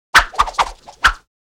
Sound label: swish multiple; crashes swishes impacts; Asian Show Sound Effects